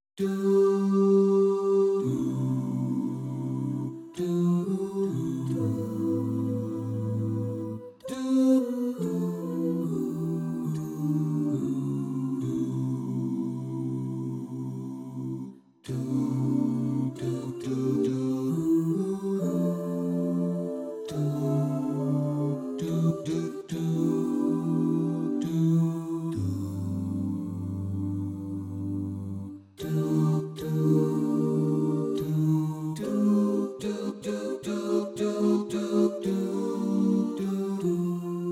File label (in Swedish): Bas